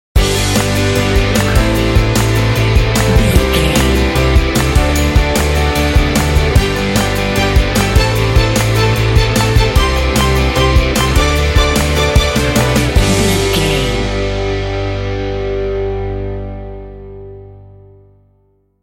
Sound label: upbeat corporate pop rock sting